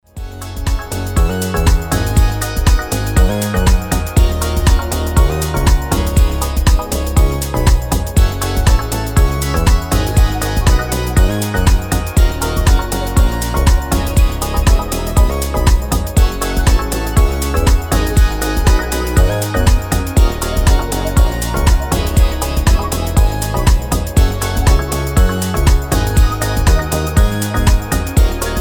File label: instrumental